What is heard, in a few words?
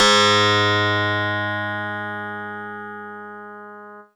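Harpsichord DX100 G sharp 0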